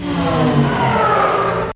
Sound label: Electro FX; Ueb HE Electro FX